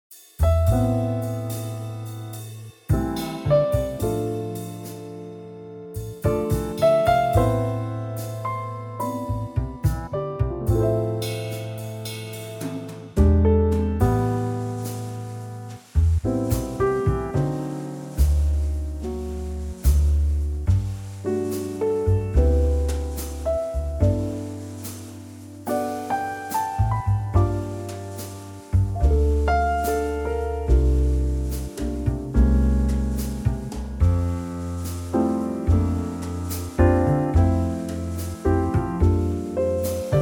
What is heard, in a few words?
C trio; key - C - vocal range - D to E; Exceptional ballad in a very lovely Trio arrangement.